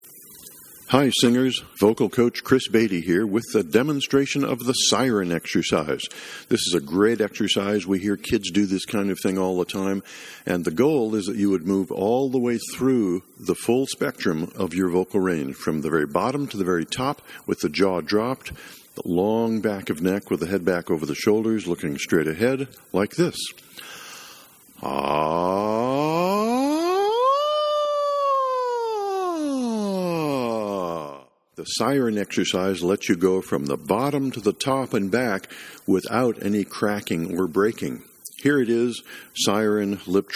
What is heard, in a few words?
Siren Exercise